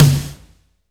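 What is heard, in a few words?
wet snare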